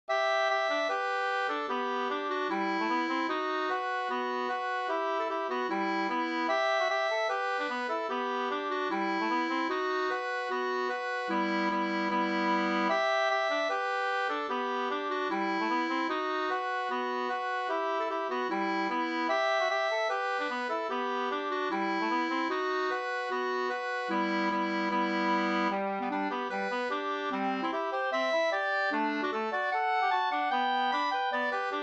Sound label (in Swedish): schottis; e Helmer Larsson Bergsjo Hs 2st V1